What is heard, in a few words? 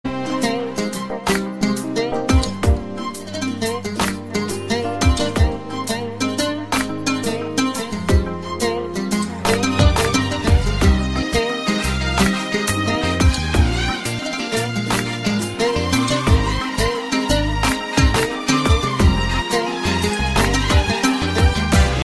arabic music